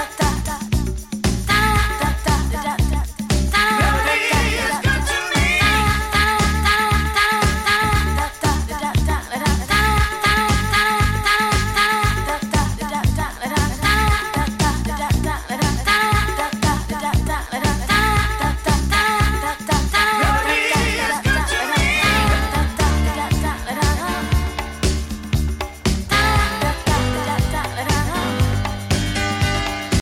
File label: 12 Inch Dance 80s Groove